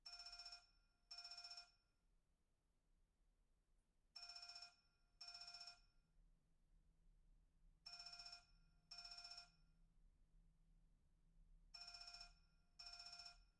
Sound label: phone; IEEE AASP